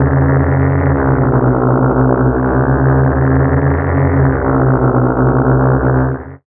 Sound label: amb c#, Ambi Drones, Chillout; Best Service ProSamples vol.36 - Chillout